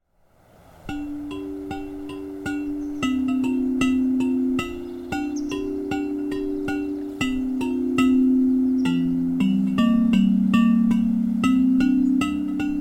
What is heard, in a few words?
tubular bells forest